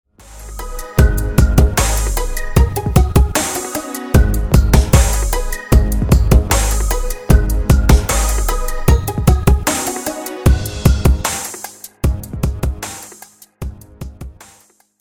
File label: Wrong Melody